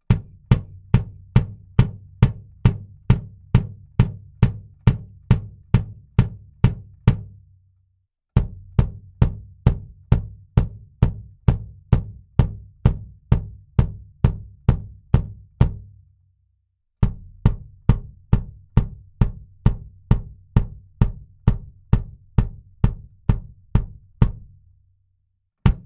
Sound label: BD Mic Test Pos F all